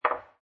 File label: Billiard Pool Snooker Place Ball On Table; Pool ball, snooker ball, billiards ball: Place snooker ball onto table; Place snooker ball onto table 06; Pool Sound Effects